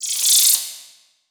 Flash Animation Short

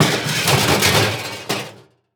Impact Metal Hit Cement Medium Hit

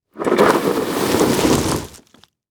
Tire Roll Over Dirt And Rocks; transportation

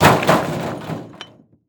Crash Brick On Car; Crashes

